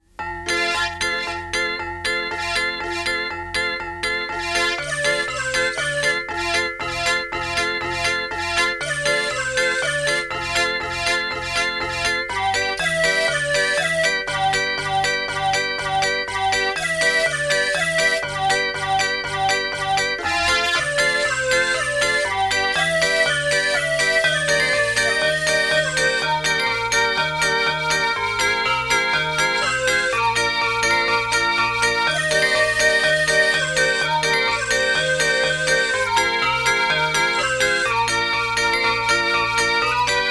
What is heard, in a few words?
China Town Instrumental